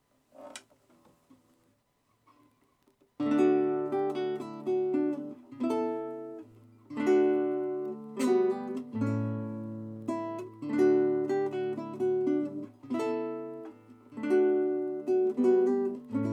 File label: Mic Placement approx 1m away